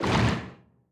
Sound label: creature swim fast; bruteshark